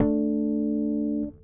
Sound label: Harmonic AD, Bass; Construction Kit; One Shots; Bass X